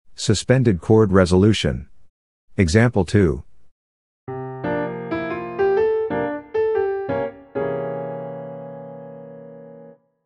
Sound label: Sus chord resolution; Sus chord resolution example